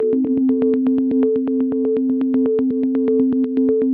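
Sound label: 122 BPM Arps A major G minor Tonic C; arp essentials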